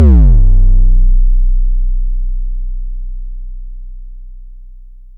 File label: boom, Korg ER-1; Kit 13